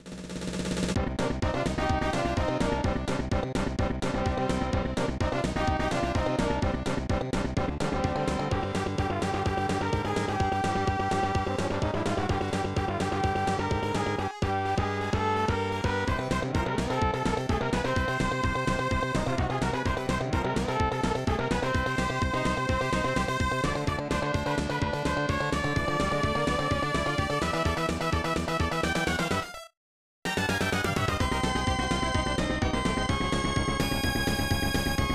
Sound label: Item Roulette; Super Mahjong; SNES